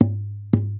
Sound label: KNOCK, Nylon Guitar